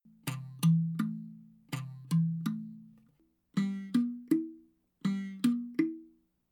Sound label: gongoma